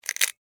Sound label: Insect, Claw, Pinch, Snap, Movement, Bug; Animals:Insects